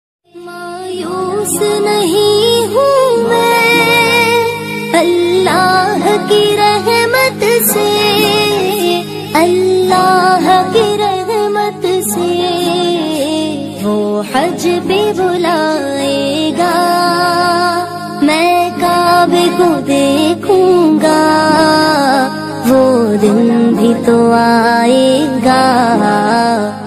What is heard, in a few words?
Islamic ringtone naate Rasool